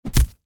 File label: collision to goal; turtle graphics game; Avaruuspeli; peliohjelmointi